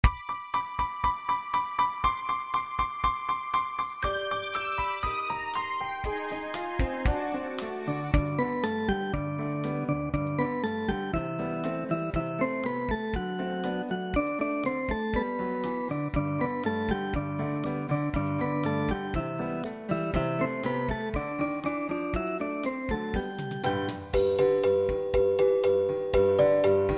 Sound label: jingle bell